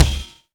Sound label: AX MIX BD; AKAI S6000 CD-ROM - Volume 1; DRUM RYTHM